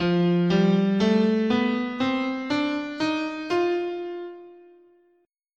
F Lydian Dominant, scale

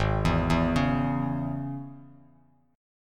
Ab Suspended 4th Sharp 5th chord, Bass Standard; 4,7,2,6; arpeggiated; JGuitar

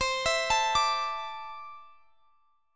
C 6th Add 9th, C, down, Guitar, Standard; C6add9; arpeggiated; capo=9

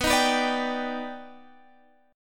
Eb Augmented Major 7th B

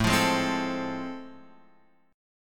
A Minor Major 7th Sharp 5th, Guitar Open F; strummed; AmM7#5; JGuitar

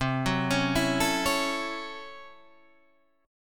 A 6th C, A6/C chord; arpeggiated

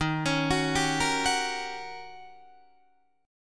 Eb 7th Sharp 9th, Eb, Guitar, Open D

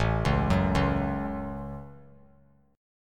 A 6th; arpeggiated

